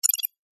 science fiction computer response feedback delicate beep tone complex; zapsplat